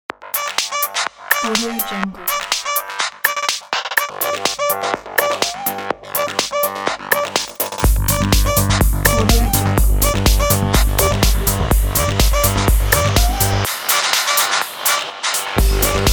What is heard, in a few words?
energetic uplifting synthwave